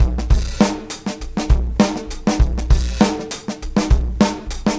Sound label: Live Beats, R&B Drums